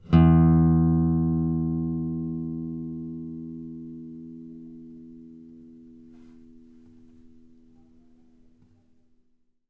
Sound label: bass, ostinato bass